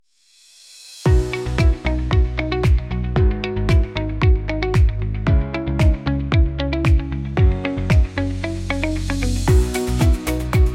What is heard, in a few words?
Techno Trance